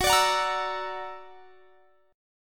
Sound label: Gb Augmented 7th Gb down; Listen to Gb+7 strummed